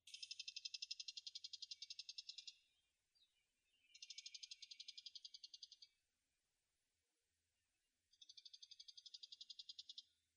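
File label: bull-headed shrike call; mozu nakigoe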